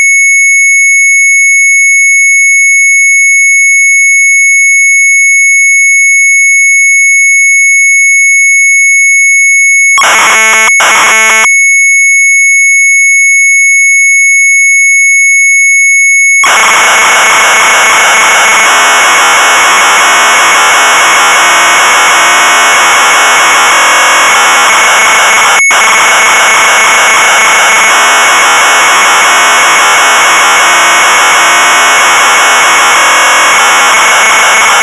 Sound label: MZ800 Tape; retromelsarchive; sharp mz800